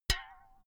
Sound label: filled can hit hard ringing